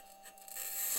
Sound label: mic, mars2020 edlcam